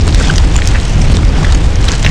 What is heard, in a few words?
megalodon sliding loop; Boss Megalodon